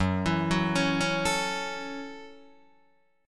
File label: F sharp Minor 7th F sharp, Guitar DADGAD